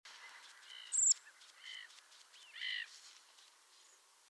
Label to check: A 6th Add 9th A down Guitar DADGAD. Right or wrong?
Wrong